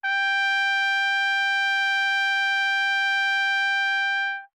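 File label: Trumpet no vibrato ff G5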